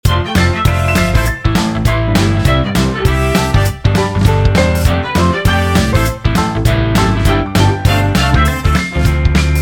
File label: motown new